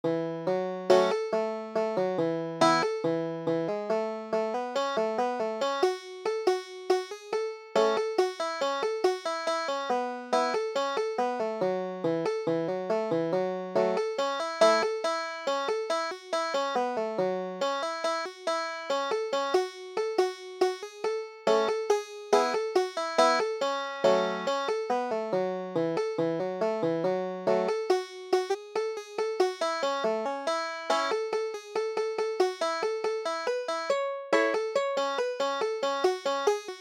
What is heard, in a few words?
coburn fork of big creek, banjo